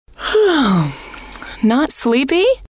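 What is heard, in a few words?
Yawn Not Sleepy, female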